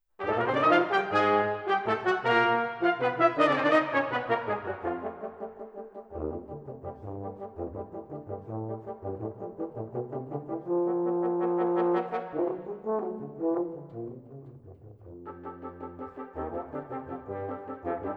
Brass, Brillante; A Brass Menagerie

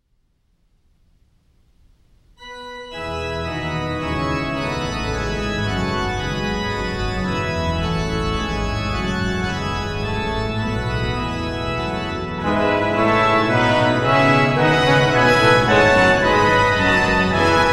Preludes, in E Major, Brass

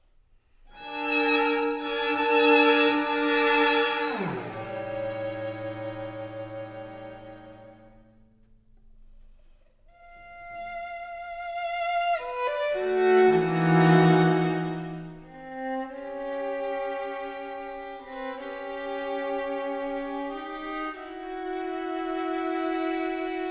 String Quintet, Majestic- Dancing- majestic; String Quintet No. 2 - III.

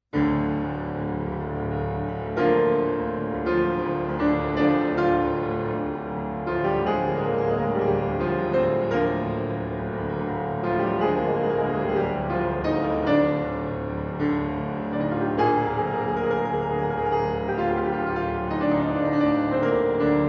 Piano Concerto, Maestoso; Brahms

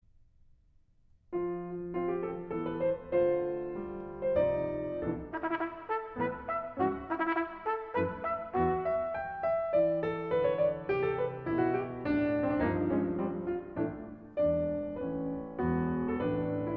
Sonata for Trumpet and Piano Massig Bewegt

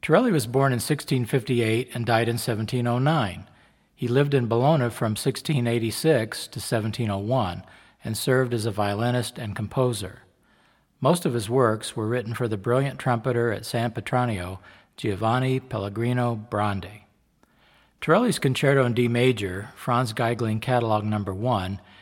Concerto in D Major, Baroque Trumpet

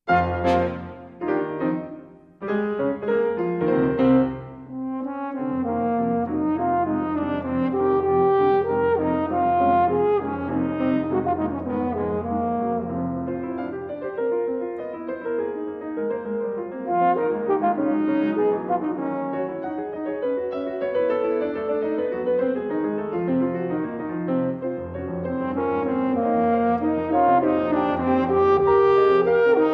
Sonata for Horn in F and Piano; Mvt. 3. Lebhaft

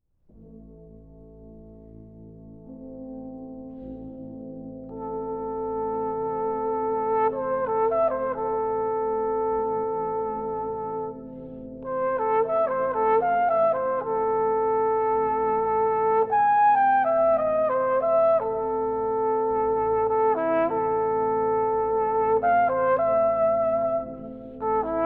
Concerto for Trumpet Brass Band, Dreamy

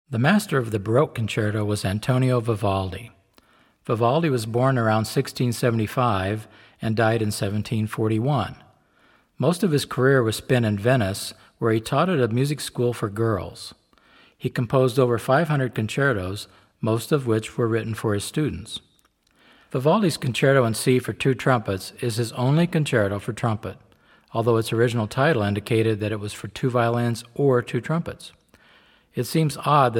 Concerto in C Major for Two Trumpets

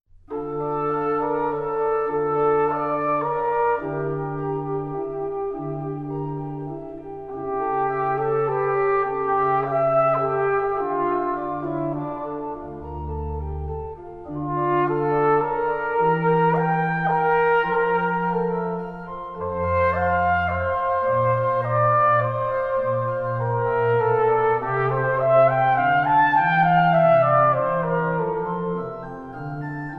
Siciliano from Flute Sonata, Trumpet

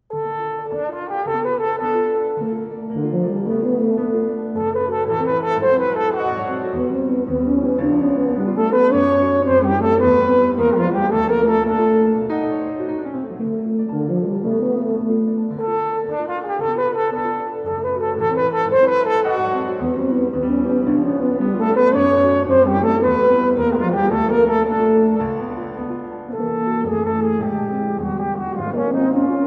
Divertimento for Horn, Tuba and Piano, Vivace

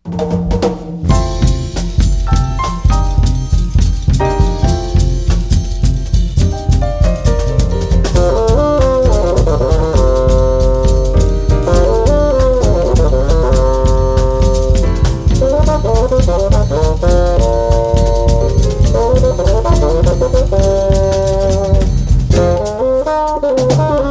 Latin Jazz; Basson; Basson Goes Latin Jazz

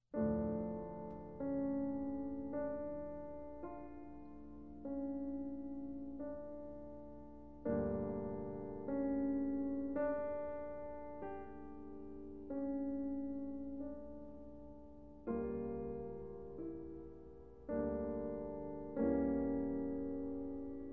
Piano Sonata In D Major, Largo E Maestoso; Caio Pagano; Beethoven

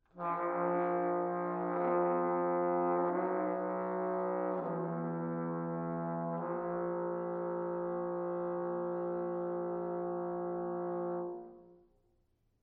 Three Etudes for Solo Trumpet; Mvt. 2. Farben

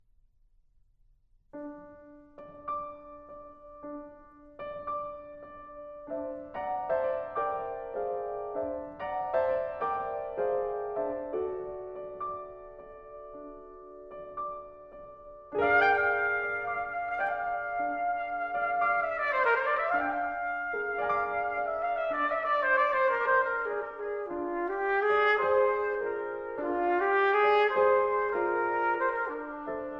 Vocalise, Trumpet Vocalise

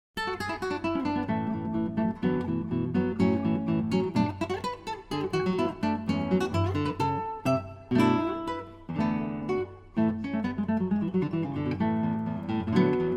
Guitar; Castelnuovo-Tedesco; 24 Caprichos De Goya

